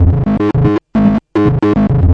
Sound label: 112 xiosynth bloop bloop bassline